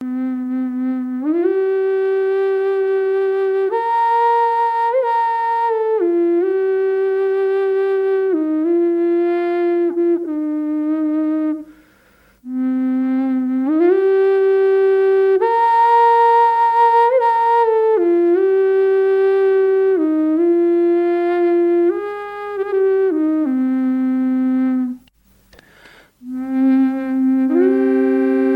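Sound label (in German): C sharp ohne, drone flute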